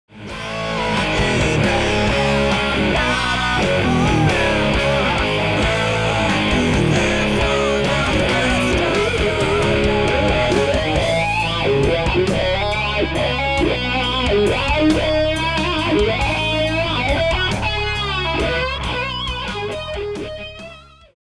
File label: Boosted Lead Guitar